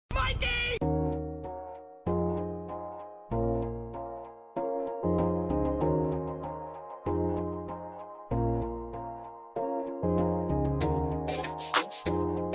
Instrumental

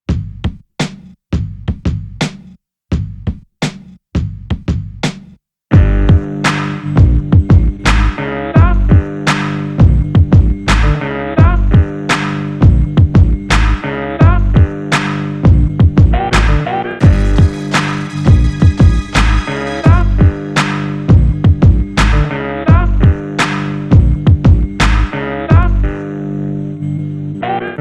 instrumental

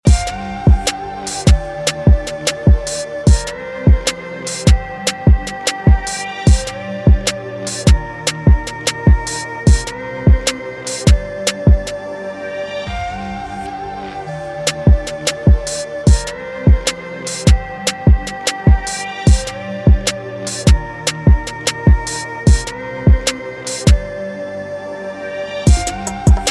Instrumental

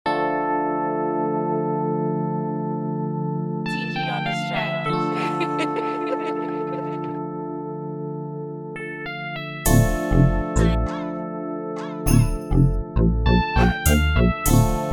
Instrumental

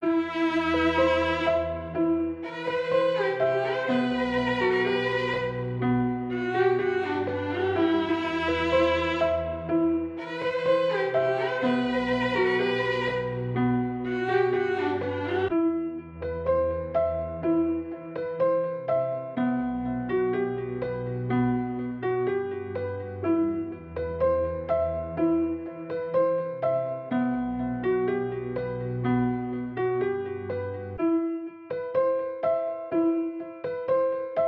124 bpm

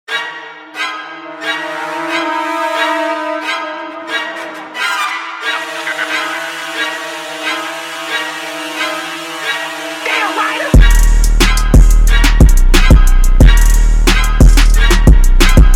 Instrumental

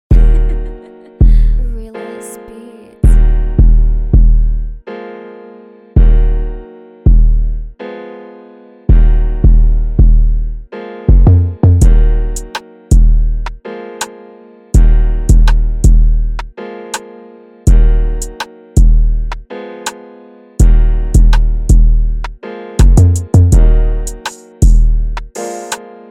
Instrumental

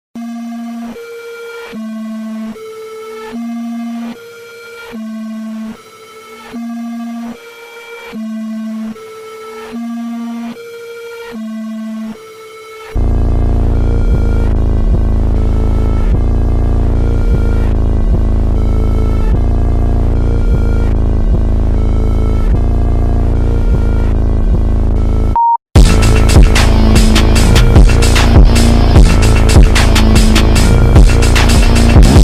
Instrumental

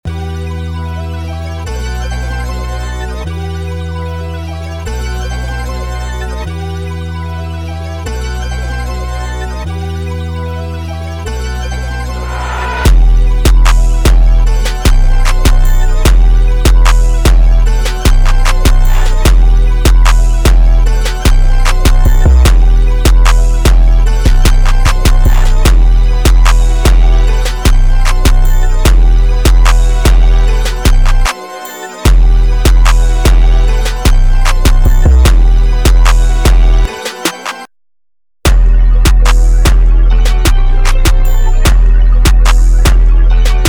instrumental

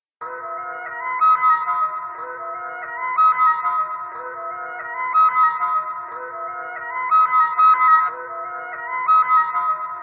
Instrumental

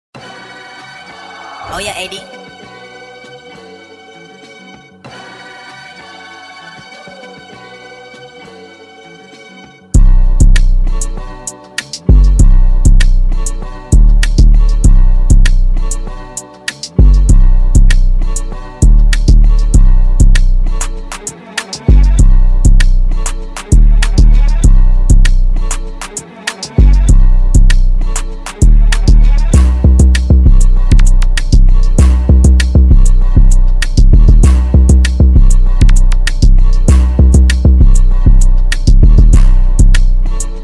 Instrumental

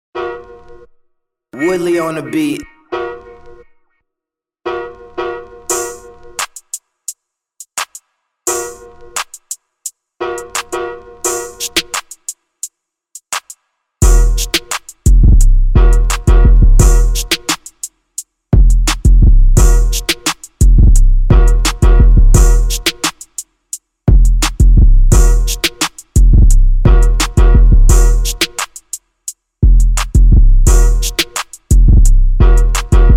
Instrumental

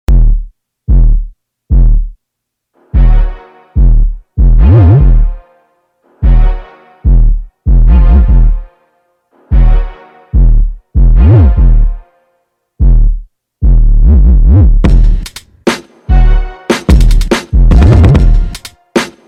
Instrumental

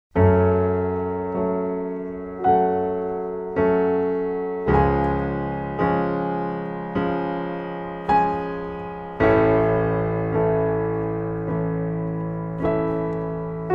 Interlude Instrumental

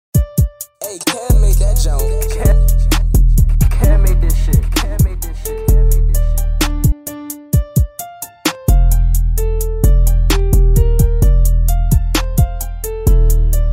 Instrumental

